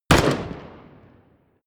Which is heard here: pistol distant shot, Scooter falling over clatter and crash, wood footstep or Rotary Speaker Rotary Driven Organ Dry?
pistol distant shot